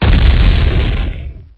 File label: Explode; WarCraft Orcs & Humans SFX